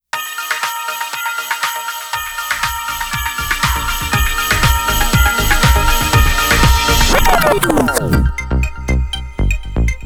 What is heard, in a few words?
(Instrumental)